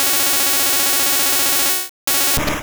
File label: Rock Slide; Pokemon; Gen 1; attack moves; RBY